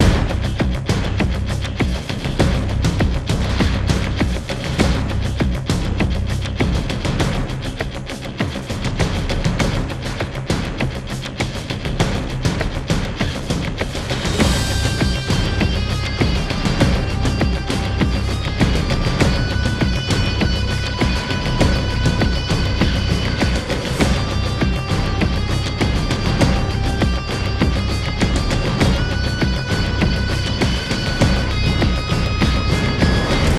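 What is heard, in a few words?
music, drums guitar loop; transformers war for cybertron gamerip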